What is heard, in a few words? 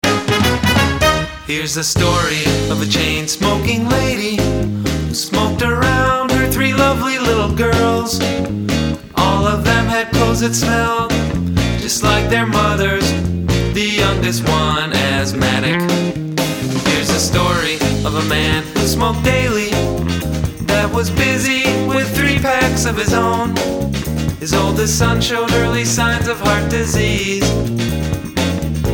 Vocal, Music